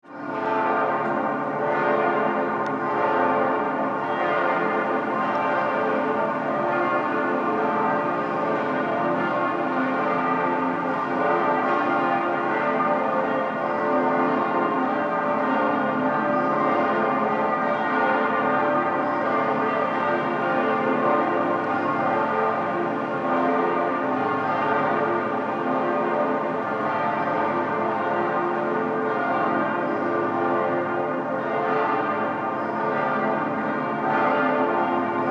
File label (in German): Glocken